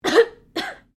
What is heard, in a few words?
Female cough medium; Female vocal sounds; Clearing Throat; Human